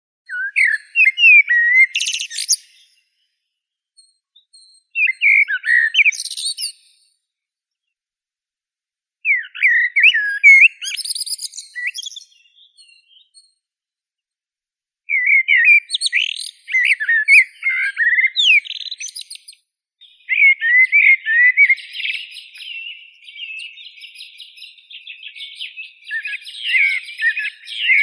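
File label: blackbird, Turdus merula; drozd chernii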